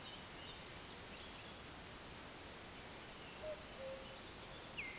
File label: Cuculus canorus